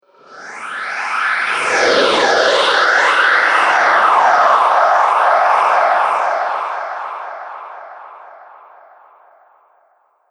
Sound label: Surfing The Universe, spacecraft sound effect; Spacecraft Sound Effects; Andrew Fleming